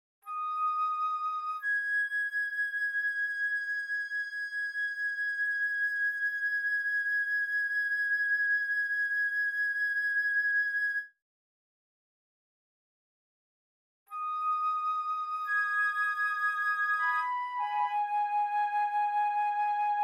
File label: Flute; Mr. Tabs